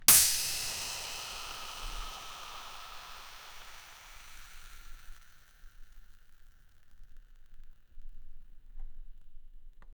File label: popping a champagne cork